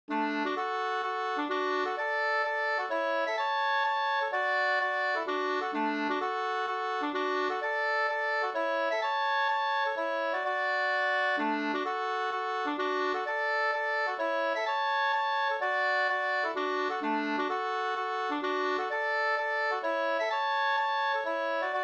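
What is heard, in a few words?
polska, two-part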